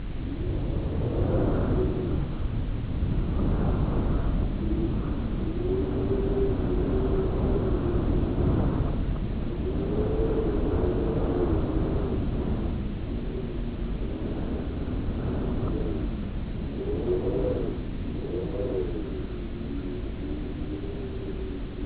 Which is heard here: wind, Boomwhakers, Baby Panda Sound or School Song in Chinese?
wind